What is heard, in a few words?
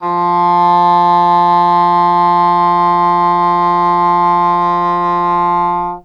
English horn F3; Q Up Arts; Denny Jaeger Private Collection